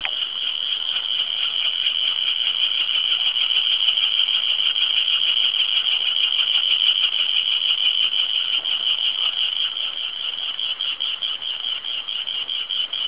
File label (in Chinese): 高砂熊蟬, 蟬的叫聲; 生物多樣性; 生物多樣性教學設計